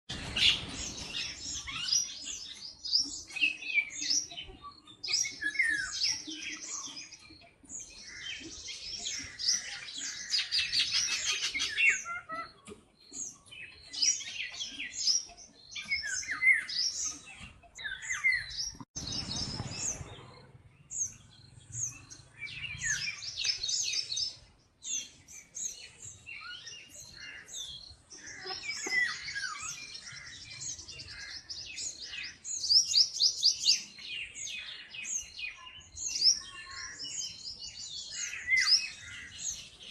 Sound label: Indian Golden Oriole